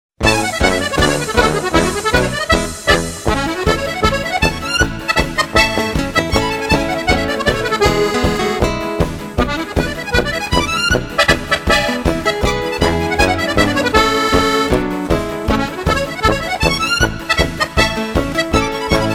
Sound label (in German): akkordeonzauber